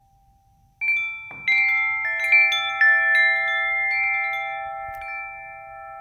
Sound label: Wind Chimes